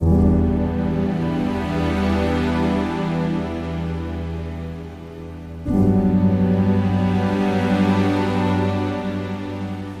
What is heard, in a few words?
Atmospheric Piano